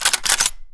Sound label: rifle reload; zombies fps